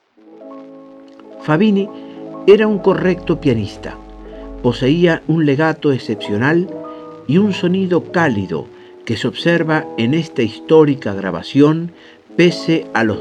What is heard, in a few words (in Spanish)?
piano; Lauro Ayestarán